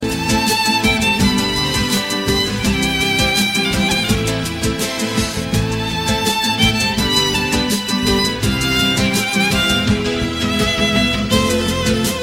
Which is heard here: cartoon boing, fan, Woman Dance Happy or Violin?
Violin